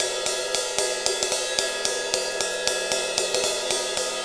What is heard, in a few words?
Ride Cymbal Pattern